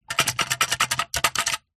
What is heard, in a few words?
office large sticky tape holder shake